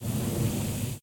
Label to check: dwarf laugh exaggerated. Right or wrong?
Wrong